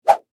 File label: Whip Whoosh Medium, Dowel, Rod; Foley